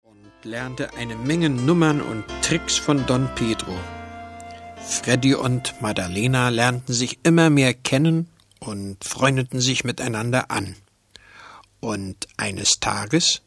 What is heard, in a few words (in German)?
Lied